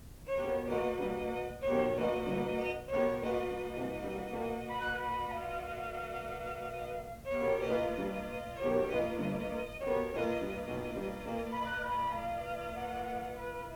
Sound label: flute fantasy